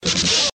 schiaffo; Inquisitor 2

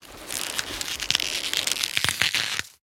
Radici

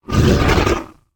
Bestia Grande Hit

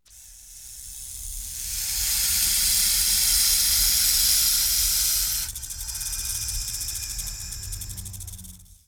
serpente; Inquisitor 2

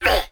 Rospo Gigante; BLIND QUEST - The Enchanted Castle

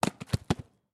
Tronco; BLIND QUEST - The Enchanted Castle

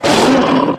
Lupo Alpha; BLIND QUEST - The Enchanted Castle

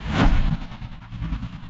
Nebbia Spazzata

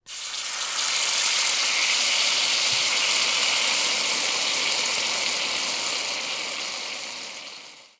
Olio Bollente

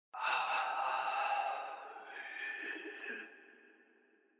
lamento uomo; Inquisitor 3

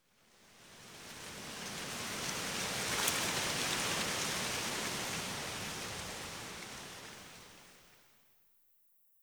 brezza e foglie; Inquisitor 3

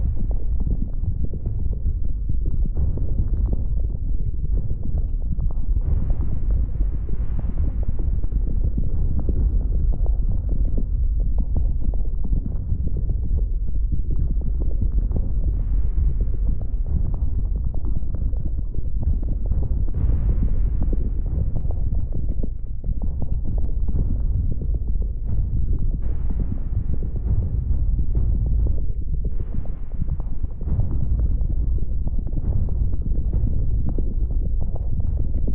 Lava; Inquisitor 2

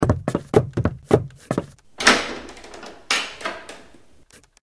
donna esce; Inquisitor 3

